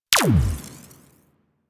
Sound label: Amp Barrel Tech, Weapons; Warframe; Operators; Amplifiers; Amp Set 2